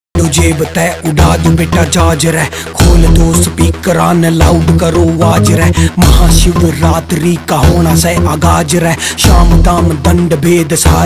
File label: haryanvi ringtone